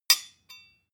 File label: sword hit clack soft